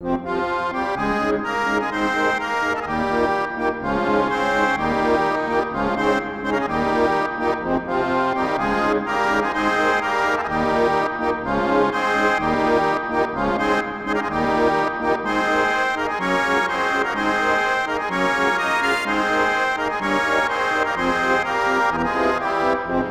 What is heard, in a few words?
Organ; Daedals Organ; Legend of Mana